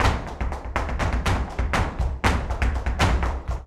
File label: Flamenco, Tapping, Bulerias, 121; ProSamples vol.46 - Flamenco